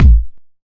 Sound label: nice bass drum one shot a sharp key